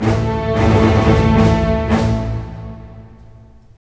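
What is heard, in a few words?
112 war, orchestra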